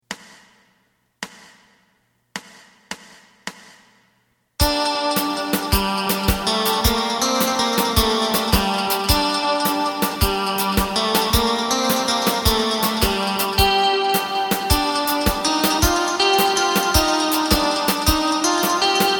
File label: bass